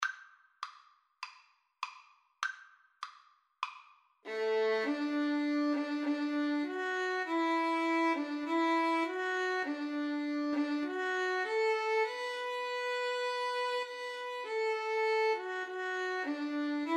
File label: Violin Cello Duet; auld lang syne